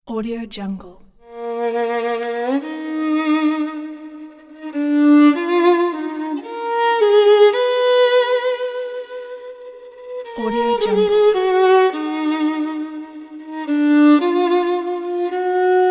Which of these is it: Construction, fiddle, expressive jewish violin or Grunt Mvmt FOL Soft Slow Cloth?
expressive jewish violin